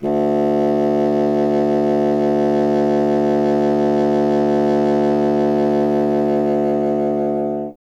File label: C. Bassoon, solo instruments; Miroslav Vitous; Solo Instruments VOL-1